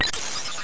ice; zsdx

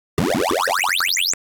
potion brew; project hawk

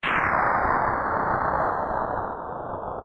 water drain; zsdx

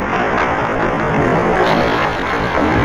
grass; stunt rally; surface

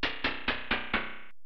internal stairs down; zsdx

